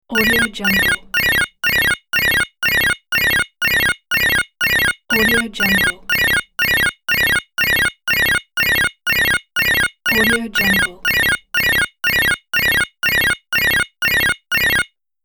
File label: scoring counter tally for games